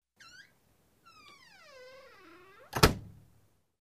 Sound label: wooden door closes with creak